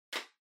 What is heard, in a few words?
Portable MD Far Close